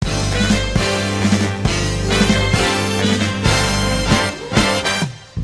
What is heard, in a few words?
music stings intro oneshot; LEGO Movie Videogame; Xbox 360; buildingsite01